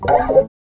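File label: GUI Rutsch rauf, acoustic guitar; Best Service Gigapack 2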